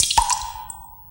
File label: Ambi Plop; Jungle Warfare 1&2